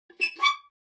Turn valve

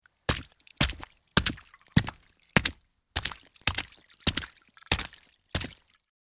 Footsteps on wet street shoes fast walk

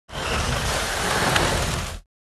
Heavy furniture moving and sliding

